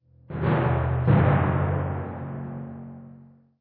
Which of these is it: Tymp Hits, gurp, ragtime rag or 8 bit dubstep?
Tymp Hits